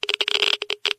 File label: geiger med